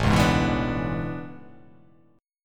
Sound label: A Major 11th, down; AM11; strummed; JGuitar